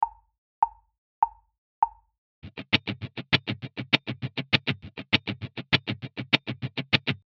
Funk Accenting 16th Mutes

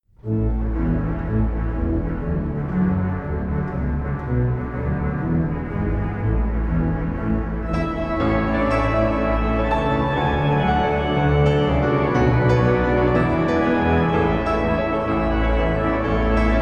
Grazioso

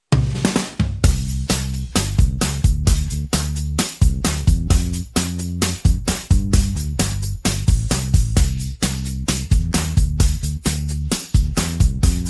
bassdrums

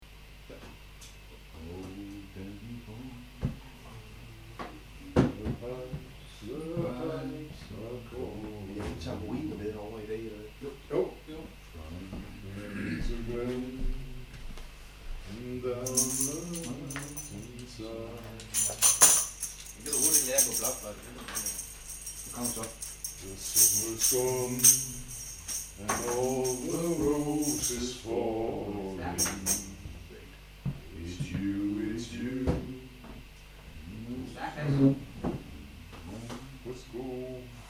danny boy a capella

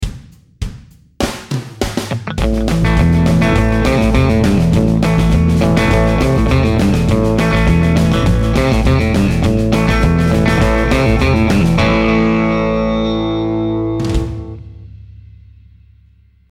Country Licks Lick, gitarren

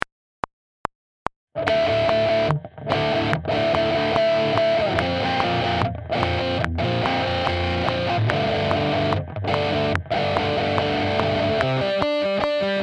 Beyond Powerchords

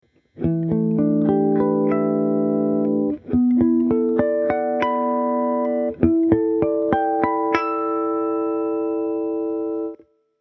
Harmonics; Introduction to Harmonics

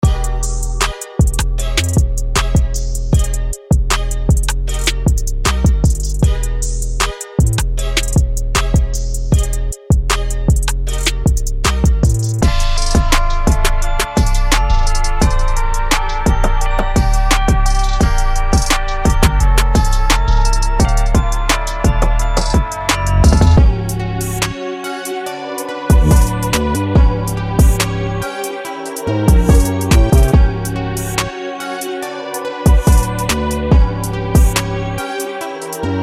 Beats

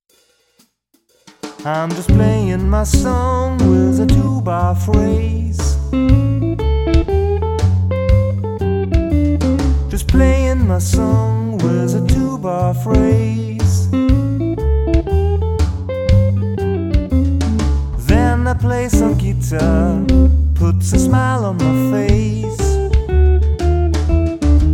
blues guitar comping

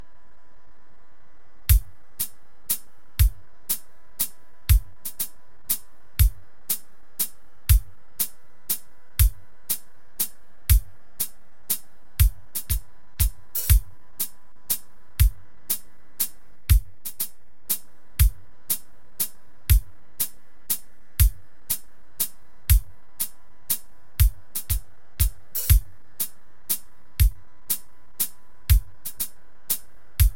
Waltz 120 BPM

